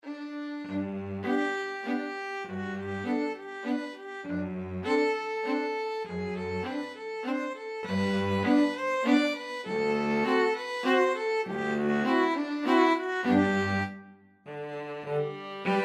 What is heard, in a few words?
german dance, string quartet; beethoven